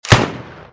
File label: m60 shot